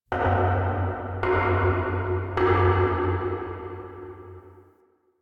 Knocks Small; Ambience

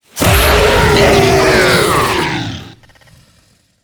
Infested Lich Drillbit Death

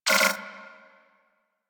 Infuse Full UI; Warframe; Warframes; Alchemist

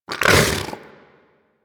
Vocal Tranquillized Grunt A; Warframe; Hunting Critters; Vulpaphyla

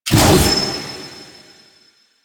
Glaive Throw; Enemies; Weapons; DE; Warframe; Teshin

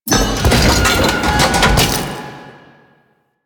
Thumper Part Pickup; Grineer Forest; Warframe; Veilbreaker; Kahl

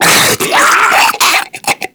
Infested Diseased Ancient Charge; Warframe

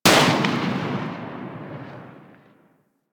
Harpoon Gun Tail; Warframe; Sharkwing; Grineer

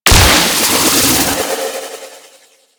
Key Cast; Enemies; Warframe; Captain Vor

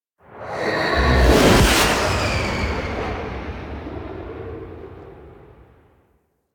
Fly By Slow, Vehicles; Zariman Liset; Flight

